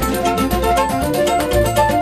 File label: mus cage forcefield; Rayman Origins; jungle world